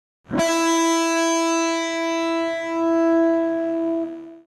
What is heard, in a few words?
vibrato none